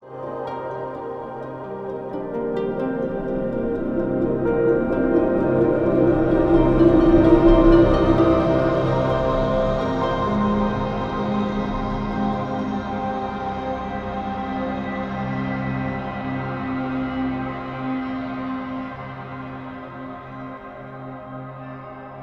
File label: Stinger Mystical; Global Conversation